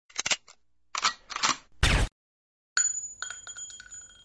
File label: shot silencer